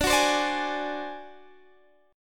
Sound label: D 7th Flat 5th D down Guitar Standard, chord; D7b5 strummed; JGuitar